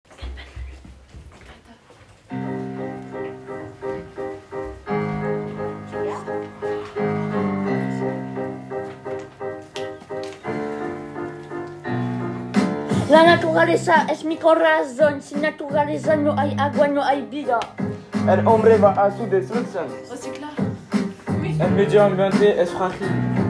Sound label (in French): RAP; 4eme